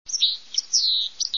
pluszcz - nawoływanie; wroblowe

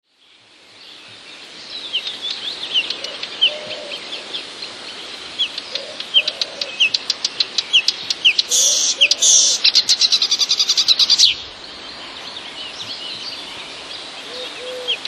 lozowka, łozówka naśladuje nawoływania pokląskwy; wroblowe; zapozyczenia

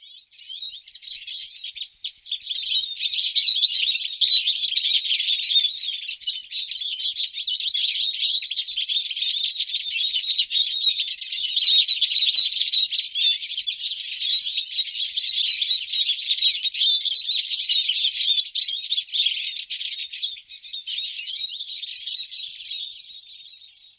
stadko czyżyków

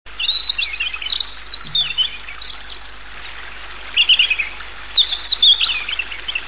rudzik nad wodą; wroblowe; turdidae

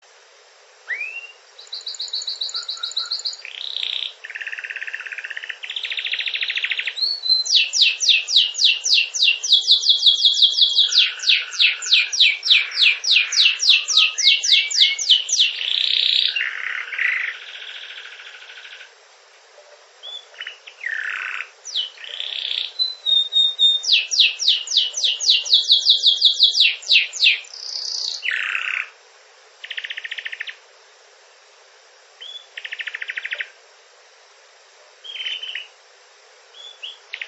kanarki

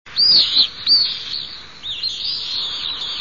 jerzyk blady - Apus pallidus, głosy

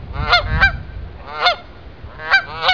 bernikla kanadyjska

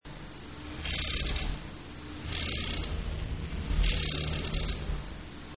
paszkot - nawoływanie; wroblowe; turdidae; turdus; Paszkoty należą w Polsce do ptaków łownych.

